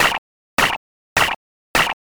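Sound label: footsteps sand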